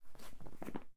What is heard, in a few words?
Journal Open; Phasmophobia